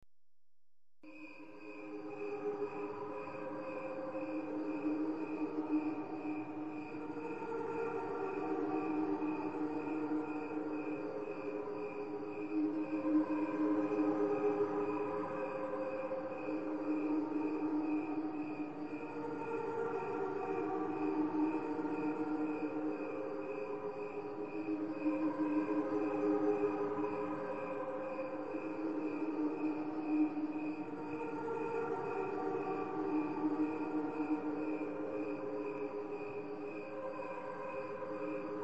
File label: cricket chorus